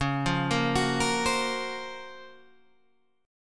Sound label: F sharp Major Sharp 11th C sharp